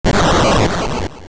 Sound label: Bomb Blow; A bomb exploding